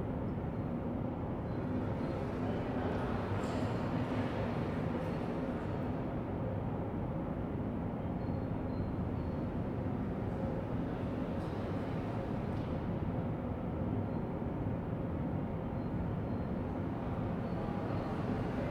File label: AMB; DEMACIA